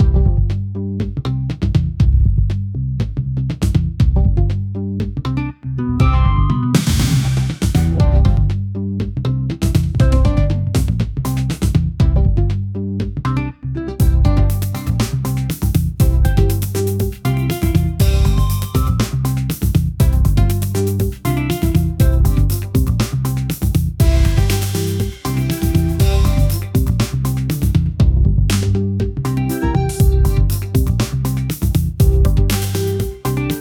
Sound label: funky vibrant and uplifti, upbeat